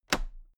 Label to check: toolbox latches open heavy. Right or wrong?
Right